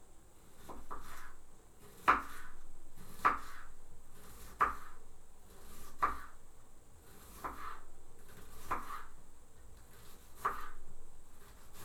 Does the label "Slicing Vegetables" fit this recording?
Yes